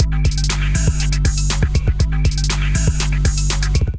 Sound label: Kit; Drums; Agile Shot Kit; Ableton Factory Packs; Beat Tools